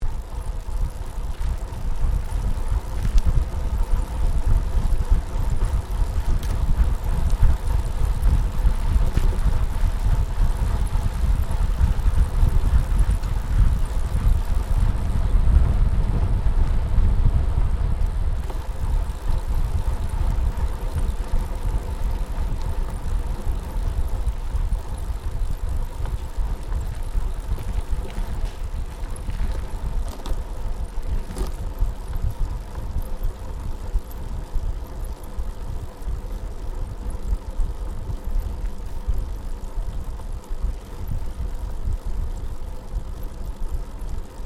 Bicycle Riding Smooth Bitumen Pedalling Downhill Stereo, 21 speed road bicycle; Giant Crx3